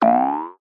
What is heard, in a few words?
cartoon bounce jump spring single jaw harp